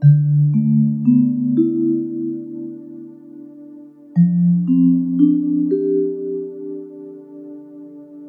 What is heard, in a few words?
vibes, Sad; Loops